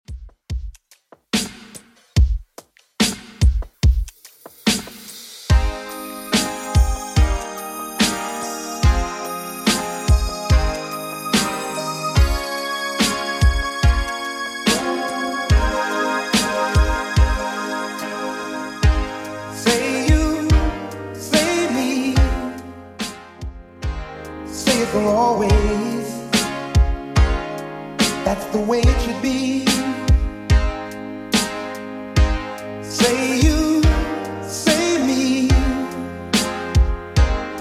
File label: 80s Redrum 72bpm